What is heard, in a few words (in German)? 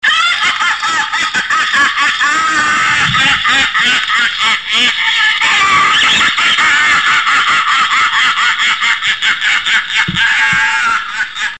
Lachsack